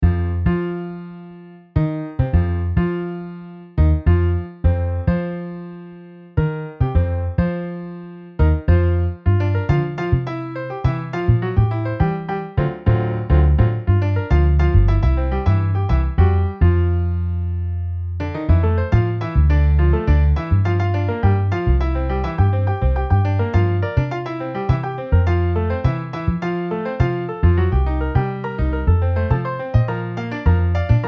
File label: Piano Bass MIDI